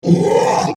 Horror Monster Zombie Growl; Zombies (Sound Effects:Horror); SFX Bible 6

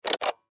Button Switch

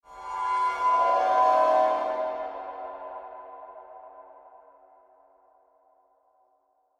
Horror Cymbal Swell Eerie Swirl; Scary Tones; Blastwave

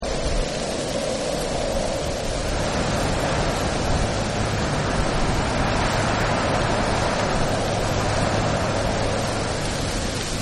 Wind Leaves; Weather

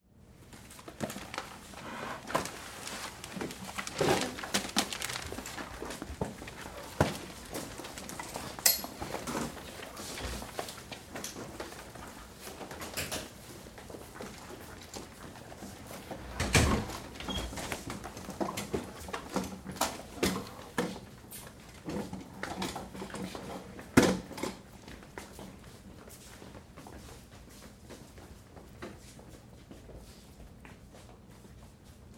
Activity Class Students Up and Leave; Schools; Big Room Sound

